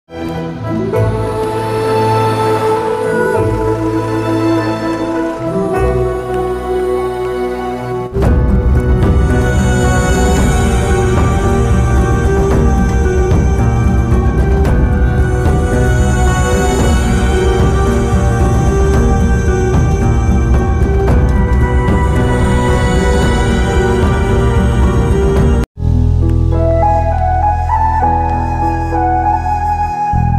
kannada movie emotional bgm; mungaru male 2

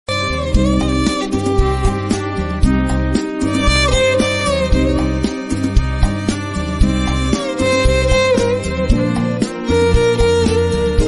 love bgm ringtone tamil 80s ringtone; mouna ragam

